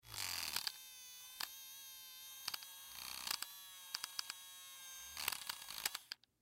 Personal Fan On Malfunction Stutter Battery Operated; Motor; Small; Foley; Fans; SoundEffects-Blastwave